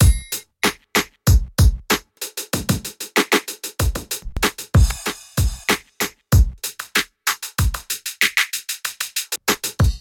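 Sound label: Delay; KP3+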